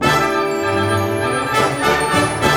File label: HIGH, ORC Highland; East West - Scoring Tools